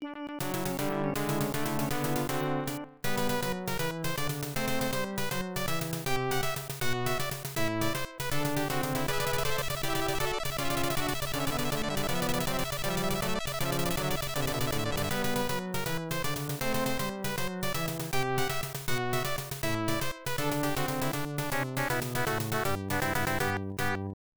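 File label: Game Set (Lose), baseball; Pro Yakyuu Family Stadium Pennant Race; MSX2; MSX-Audio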